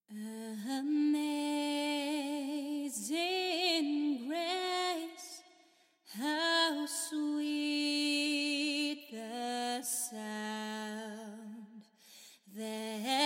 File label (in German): dunkle Klangfarbe